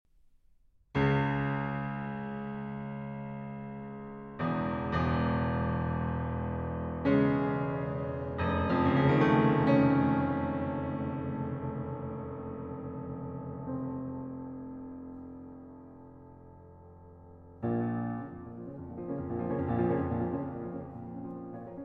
Sonata In B-Flat Minor Funeral March Grave - Doppio Movimento